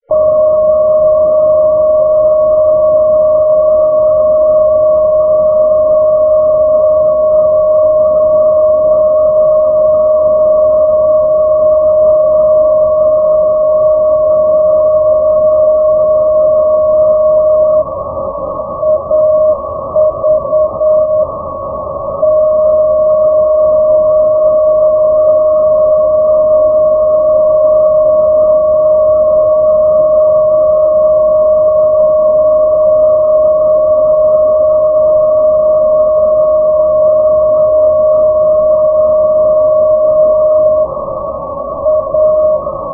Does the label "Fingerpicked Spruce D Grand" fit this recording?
No